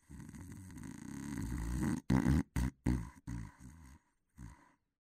old man snore; Fruity Burst 2

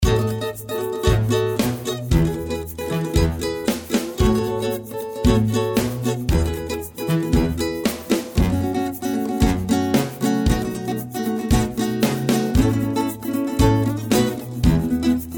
bg base game track; Lucha Rumble

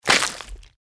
explosion; Fruity Burst 2

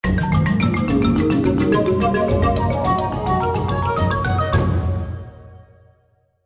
musical spin; Shamans Dream 2; reel

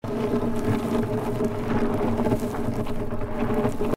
reel spin; Stampede Call of the Pride; Eyecon

